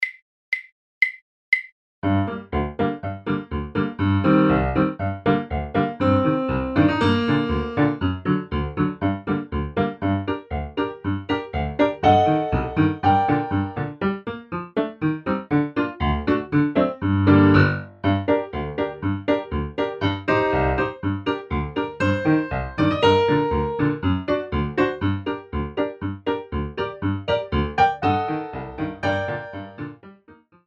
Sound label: Violin C